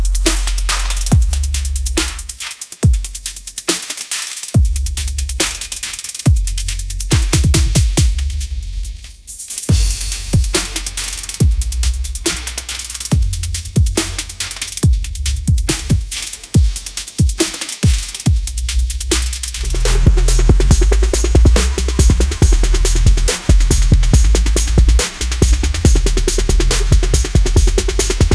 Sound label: edm trap 140bpm